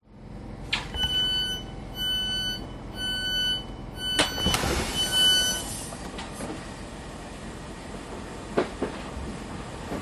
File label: train london door opening and closing and train departing by the platform; facing; london music mixing